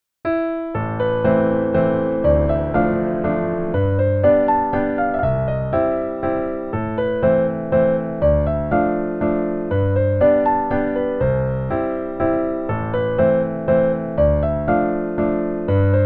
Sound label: Valse A minor